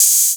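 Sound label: 808 hi-hat; Wizoo - Platinum 24 Electronic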